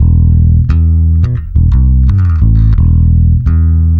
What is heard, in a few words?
bass origin; steinberg cubase 5 variaudio